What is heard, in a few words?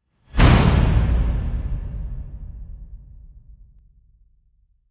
Infected Whoosh; Gothic Storm Music